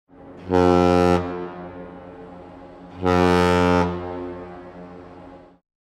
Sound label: Ship; Huawei C8813D